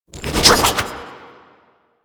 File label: Sword Spin; Warframe; Duviri; Duviri Dax; Weapons